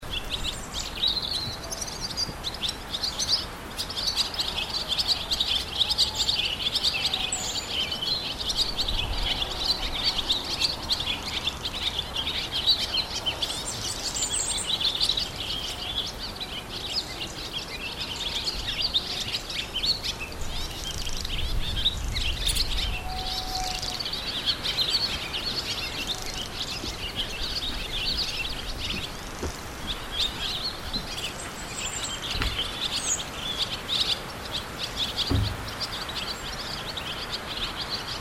birds; fieldrecs

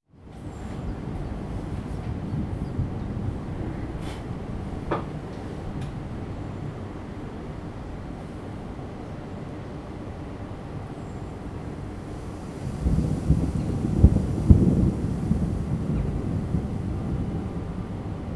work light thunder pc fans; fieldrecs

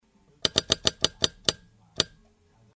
clicks; fieldrecs

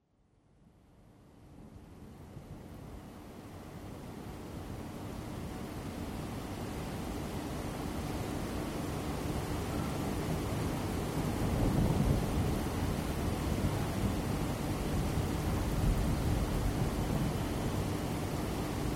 work light thunder pc fans; fieldrecs

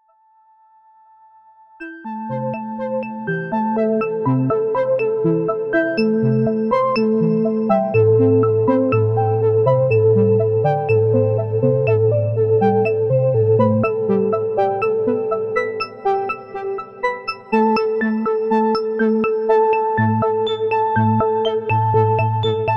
tempo constant poly sequence

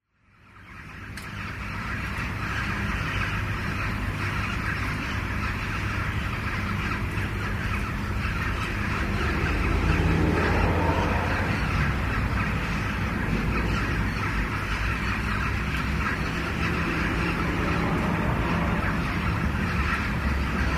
many birds cars; fieldrecs

